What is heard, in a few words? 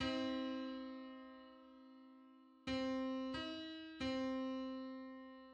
Thirty ninth harmonic on C